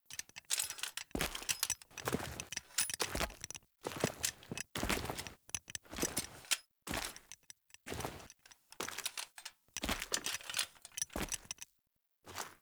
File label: Blacksmith Wait Rise Idle To Table Idle; Friendly; Tuvalkane; Blacksmithing